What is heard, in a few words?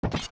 Steps Bridge Jump; zelda; OOT